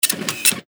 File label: printer